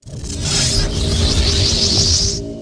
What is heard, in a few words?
sorc cast; DAoC; Toa; Gamestar